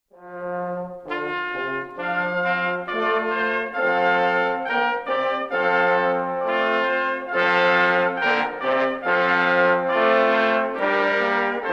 Blaeser, Blockfloeten-Quartett; Blaeser der FeG Guenterod

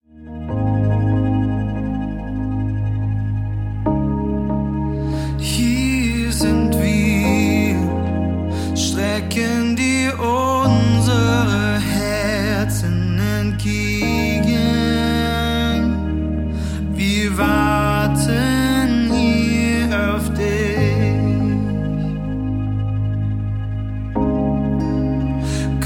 akustik sessions

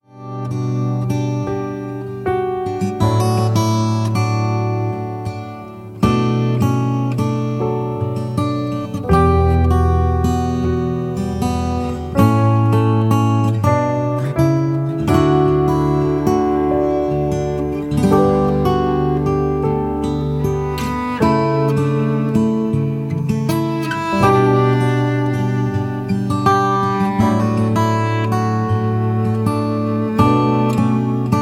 Hallo Welt Instrumental

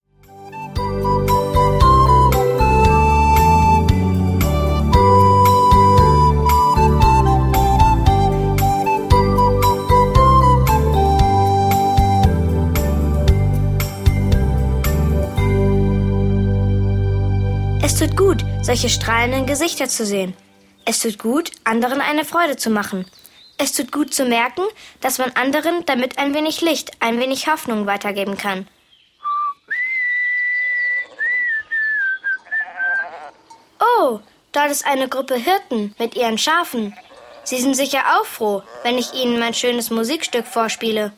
Floetenmelodie

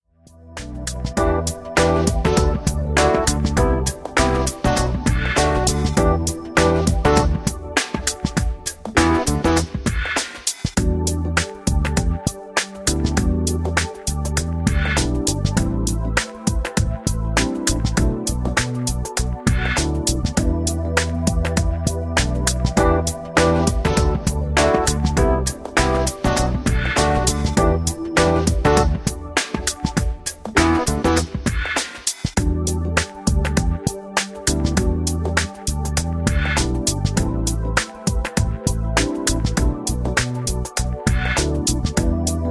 Playback ohne Floete

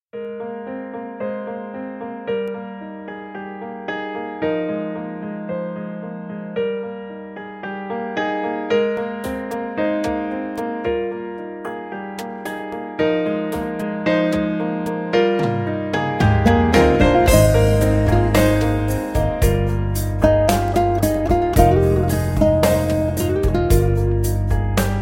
O du froehliche, Childrens Corner Studio Chor

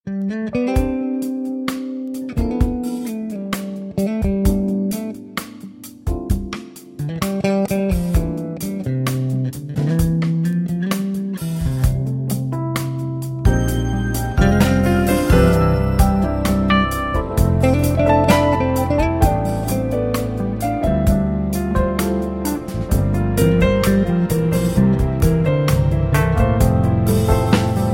Childrens Corner Studio Chor

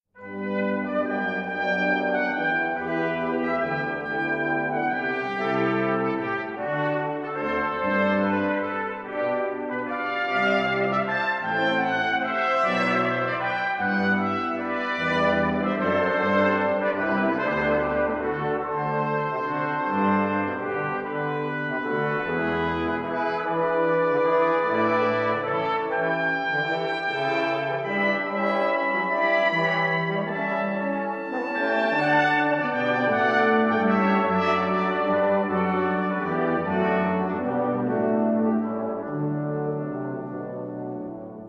Hirtenmusik, Instrumental, Blechblaeserensemble

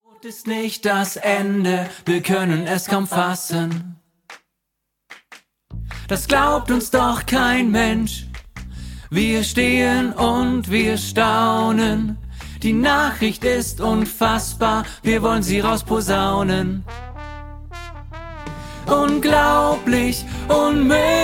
Lern Tenor und Solo

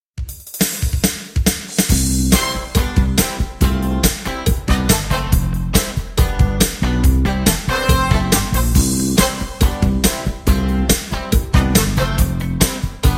Halleluja sing along

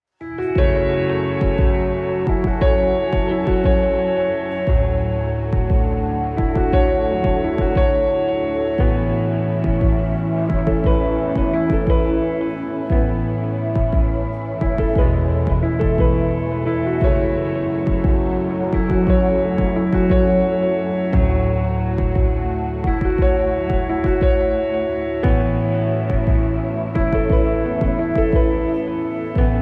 akustik session

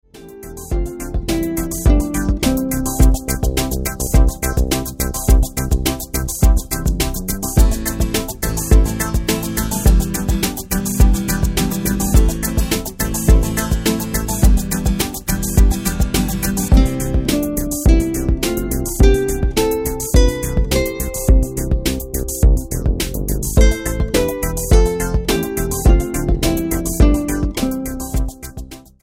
Die Tonleiter

